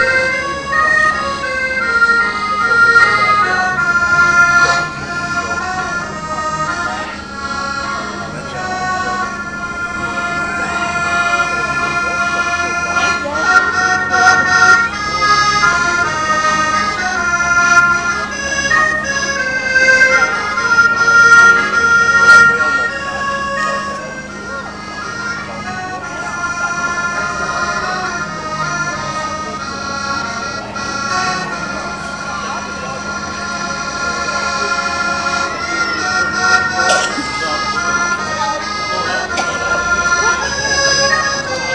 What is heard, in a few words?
father christmas playing the accordion at christmas market; friargate christmas market